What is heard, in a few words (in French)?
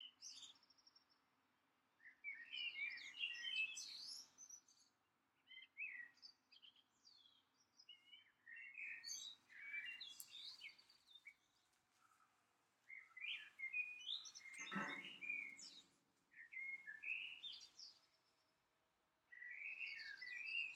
oiseaux juin